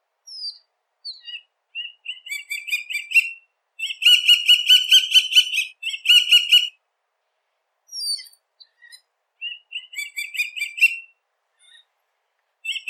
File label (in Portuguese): Canto do Gavião Carijó